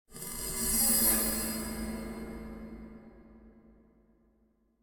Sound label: Metal Drone Cymbal Reversed Creaking Increasing - Fast And Thin; Hollywood Edge; sound designer's toolkit v2